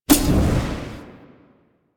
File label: Sticky Bomb Throw; Grineer; Veilbreaker; Vor